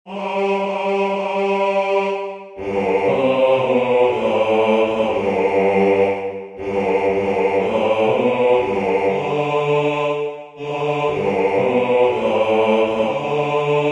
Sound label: Bass; COME AGAIN; JOHN DOWLAND